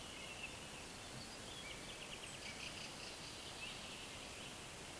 Turdus pilaris

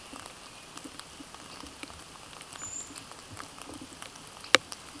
Certhia familiaris

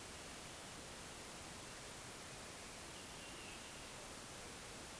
Turdus iliacus

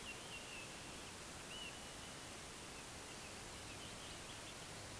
Haematopus ostralegus

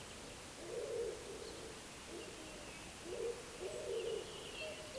Columba palumbus

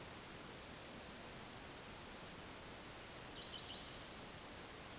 Phoenicurus phoenicurus

